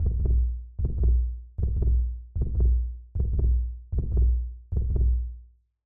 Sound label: Heart Beat; Sound Spark LLC; Forge Sound Design Toolkit